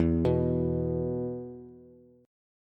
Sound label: E 5th E down Bass; arpeggiated; JGuitar